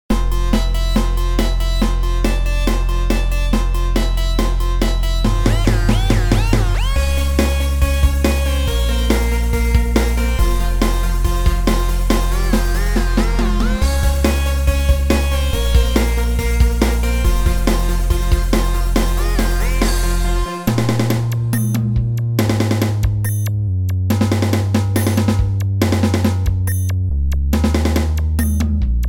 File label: Hyperspace Drums